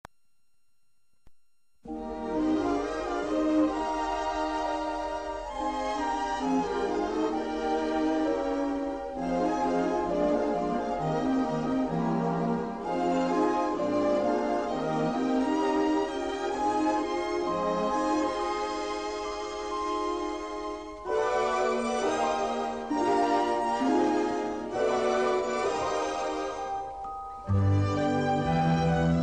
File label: Queue Music; Peter Pan's Flight; Disney Music